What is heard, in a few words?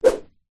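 cane bamboo stick swing whoosh air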